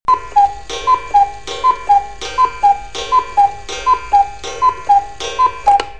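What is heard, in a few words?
cuckoo clock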